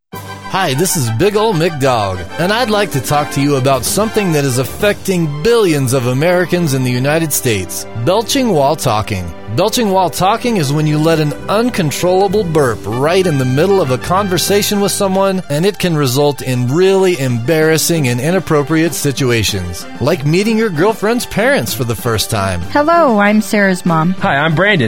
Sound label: Belching While Talking